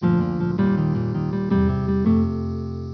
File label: jingle